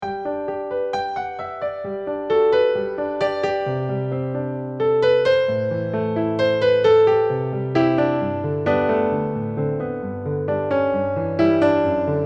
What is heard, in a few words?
folksong